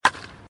cricket

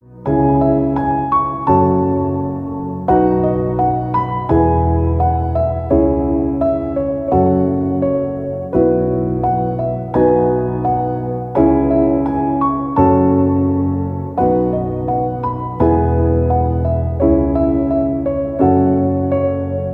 barbie piano ringtone

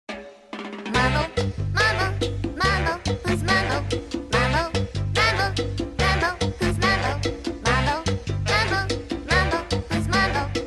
mambo ringtone; anime

